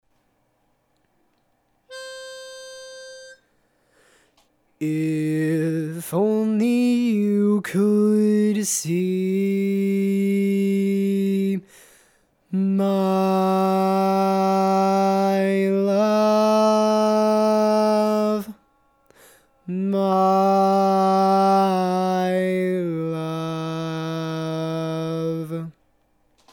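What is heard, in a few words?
baritone, barbershop tags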